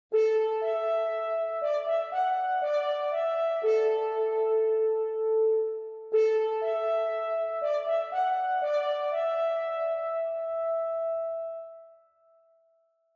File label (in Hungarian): csak motívum; AirventZrt